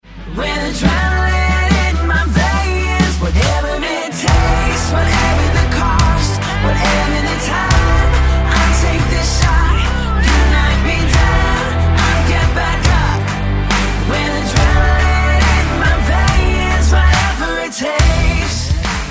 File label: Sample Singing, female singers